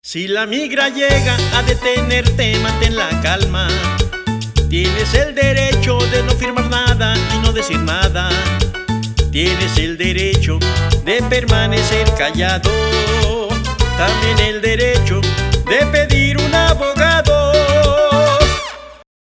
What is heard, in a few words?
Ring Tone